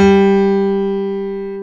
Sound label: AIR PIANO G2